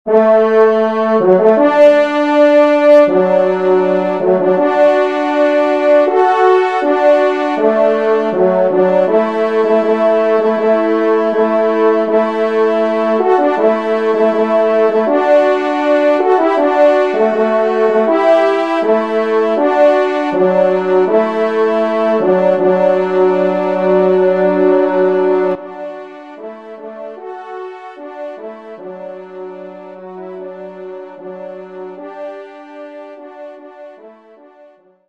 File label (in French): Entree, trompe; Devert; Entree 1e Messe